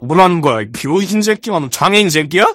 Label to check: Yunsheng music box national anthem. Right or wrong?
Wrong